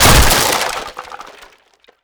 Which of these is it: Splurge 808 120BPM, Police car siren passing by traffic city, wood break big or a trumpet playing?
wood break big